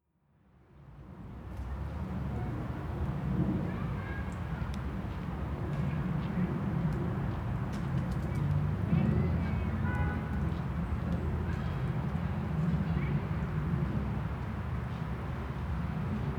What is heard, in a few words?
park children traffic plane